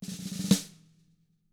ROLL, SNARE ROLLS; ILIO; Double Platinum Drums 1

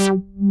TECHNO; Pro-Rec - Synthsonic; Z1 TECHNO

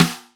Rimshot Snare, Drum Machines Roland R-5

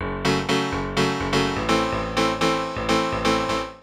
House Piano (125BPM), retro house

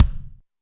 kick, Zoom MRT-3B

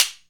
PRC SLAP, Orchestral Perc; Roland LCDP03

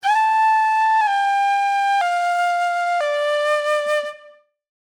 Shrill Flute; Sonic Foundry (Sony Creative Software); Manhattan Flutes

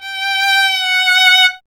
STR G 5, Solo Instruments Vln; Miroslav Vitous

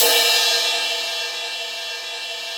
RIDE, Drum Dry; East Collexion; Drum 1 Dry

